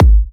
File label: Kicks G#; markus hakala